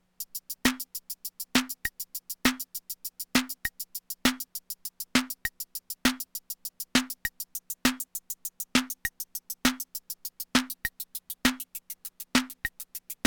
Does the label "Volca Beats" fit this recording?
Yes